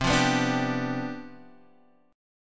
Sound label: C Major 7th Suspended 2nd Suspended 4th, C, down, Guitar, Half Step Down